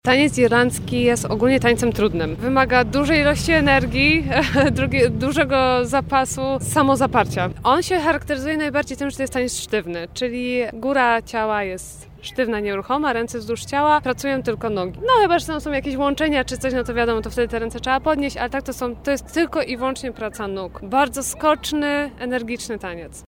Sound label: irish steps